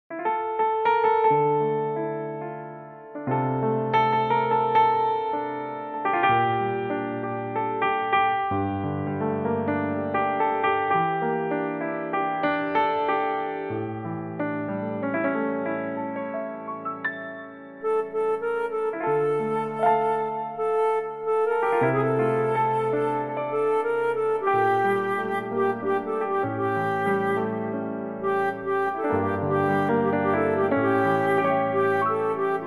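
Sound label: piano play flute reference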